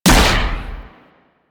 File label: Railgun Shot; Weapons; Warframe; Archwing